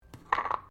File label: JAR GLASS LID PLASTIC DROP BENCH; Various kitchen jars; Drops (Sound Effects:Objects)